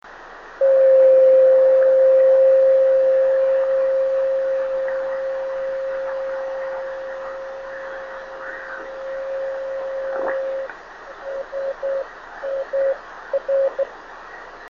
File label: 26840KHz, beacons; driftnet beacons